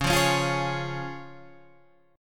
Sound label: C sharp Major